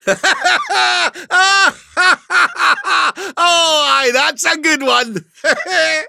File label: battle laug; dqxi